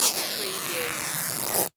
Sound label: Cloth Rip Fabric Tear